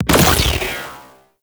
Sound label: Barrage Fire; Warframe; Sentients; Ortholyst